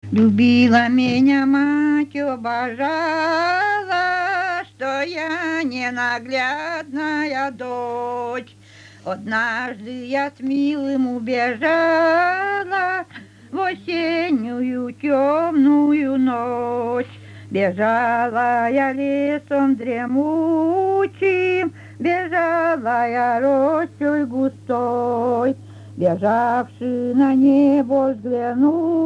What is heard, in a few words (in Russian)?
Любила меня мать, обожала; folklore